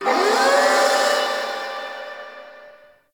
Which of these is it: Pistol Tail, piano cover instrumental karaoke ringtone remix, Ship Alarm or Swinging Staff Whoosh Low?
Ship Alarm